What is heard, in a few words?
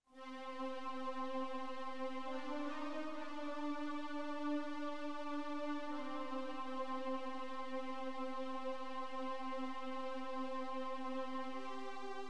String both; QFG1